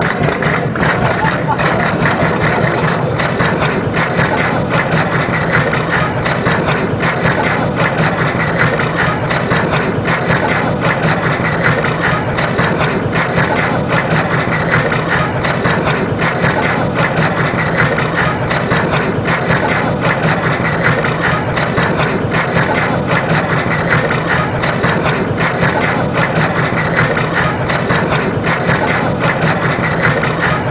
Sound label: claps, chants